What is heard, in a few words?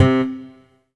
GUITAR C2; ART GUITAR; Q Up Arts; Dream Experience